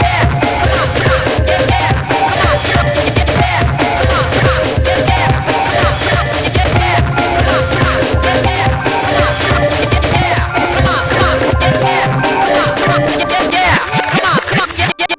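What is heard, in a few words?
142 D minor, Hardcore Breakbeat; Big Fish Audio; Hardcore Breakbeat Action; Shake That Booty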